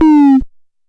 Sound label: Drop Hi, Stylophone Beatbox